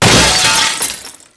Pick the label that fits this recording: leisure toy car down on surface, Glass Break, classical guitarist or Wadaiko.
Glass Break